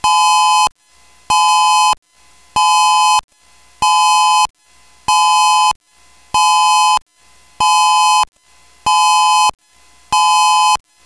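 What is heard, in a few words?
Pulsed Steady, outdoor warning siren; MOD: Modulator® Speaker Array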